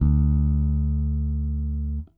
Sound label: Whole Notes D, Bass; Bass X